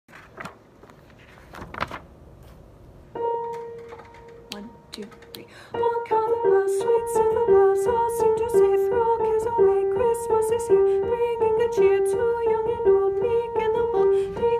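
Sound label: carol of the bells soprano part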